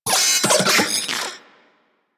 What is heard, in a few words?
Vocal Alerted; Warframe; Corpus; Mini Moa